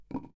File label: Wood Impact; Phasmophobia